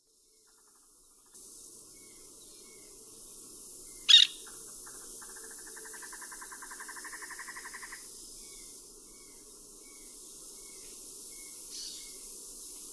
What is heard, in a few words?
Aratinga nana